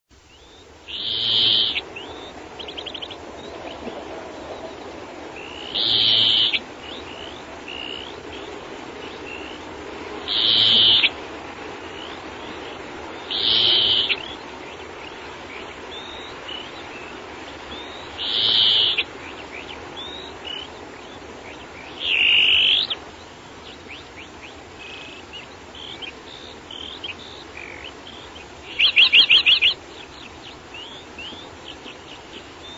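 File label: greenfinch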